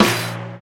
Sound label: airy snare drum a sharp key, Loudest frequency: 2159Hz